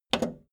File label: video tape player plastic rewind click fast